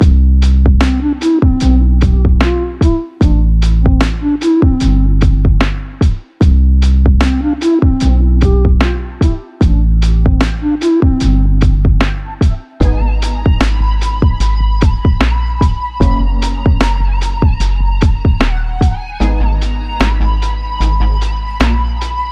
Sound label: Chill Hip Hop Loop & One Shot; Fire